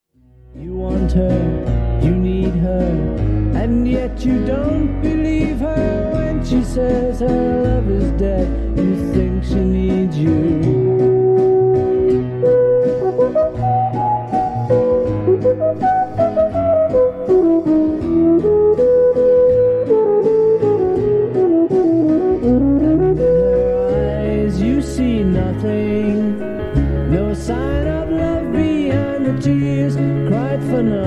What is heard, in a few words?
Horn Solo